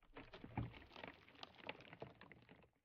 苍白垂悬苔藓声

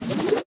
旋风人蓄力声

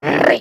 潜影贝环境声; ambient

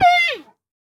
熊猫受伤声

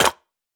泥砖踩踏声

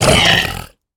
猪灵死亡声

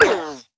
犰狳受伤声

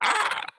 炽足兽受伤声

